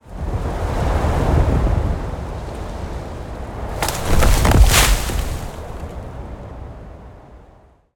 ambience wind blowing short texture tree falling; bluezone; sonniss gdc

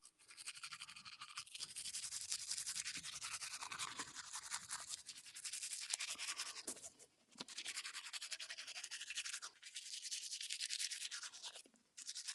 teeth brush male; sonniss gdc

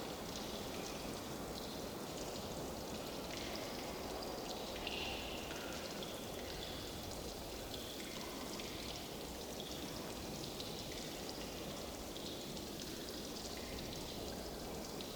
Frozen Cave, ambiences; Michael Ghelfi

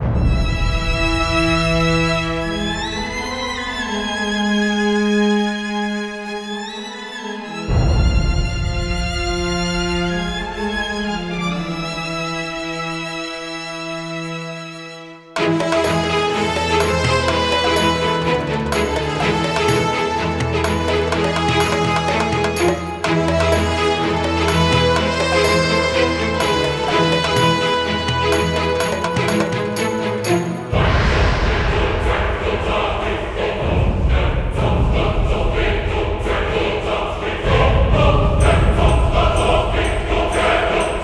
persian battle; Sand

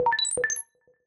notification futuristic beep; sonniss gdc

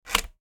slide generic small plastic turn back; fvtt sonniss gdc audio

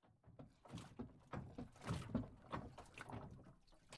docks; baileywiki maps premium towns

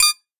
access denied high; sonniss gdc

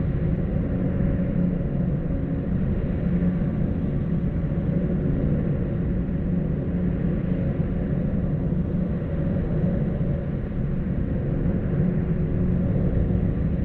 airy intake hum scifi futuristic constant engine; Sonniss GDC

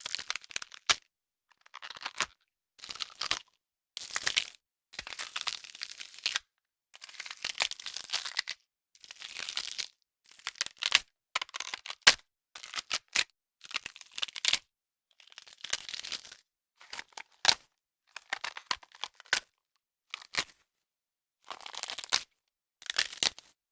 gore crack bones crush and break with tension; sonniss gdc

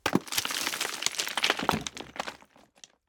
rock impact slide wood cave mono; sonniss gdc

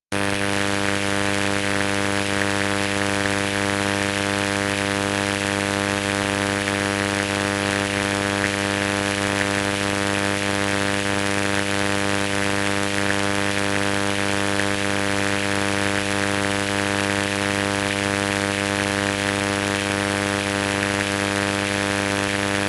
electrical interference crackle drone; sonniss gdc

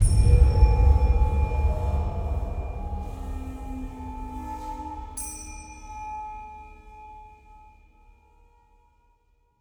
stinger elder gods

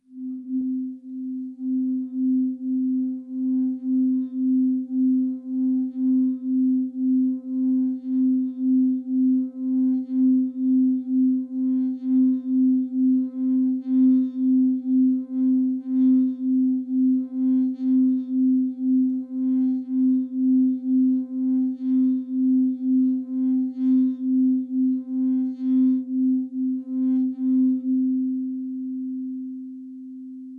crystal glass huge tone rubbing musical; sonniss gdc

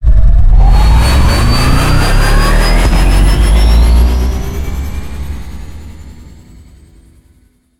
lightbike takeoff; sonniss gdc

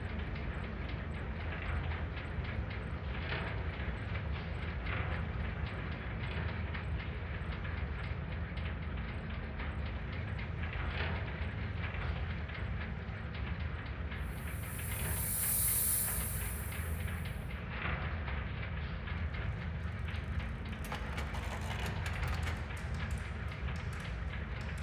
steampunk castle loop, ambiences; Michael Ghelfi; RPG Ambiences Vol. 6 (Short loops)

